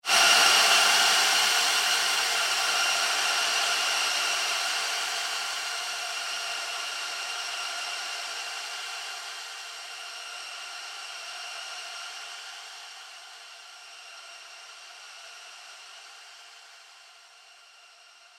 Galactic Gas, sci fi sound effect; Science Fiction Sound Effect; From B Movies To Blockbusters; Andrew Fleming

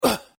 Pain Umph, Male Painful Grunt, Hurt; Grunt Sound Effects; LYN116 package of human sound effects

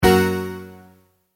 multimedia tone exclamation; Tones; Sound Design; McKinney Sound

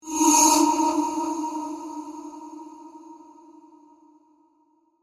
Swell, Horror, High, Pitch; Swells

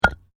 PAVING STONE FALL

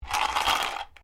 Dice medium shake plastic cup; Variety of dice sounds; Casinos; SoundEffects Foundation2

